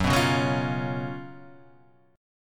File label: F sharp Major 7th Suspended 4th